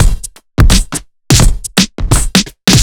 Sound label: Trip Swing Mix, 85bpm; off the grid